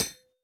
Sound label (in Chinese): 铜格栅踩踏声